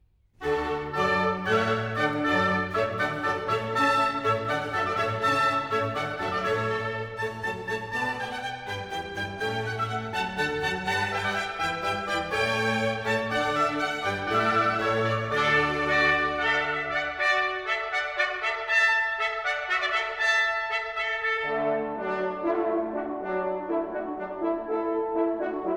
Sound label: Hornpipe, Haendel